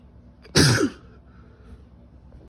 sneeze

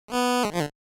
8 bit sound effect

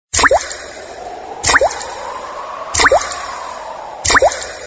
dropping water

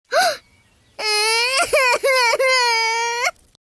crying; ladybird lu

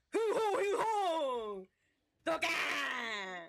huhohuhon

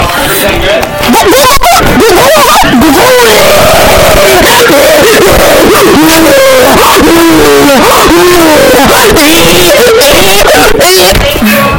gulp scream

